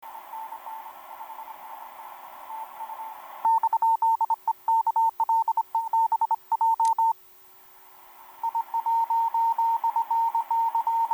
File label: 17m cw; kl7j; i1fy; North America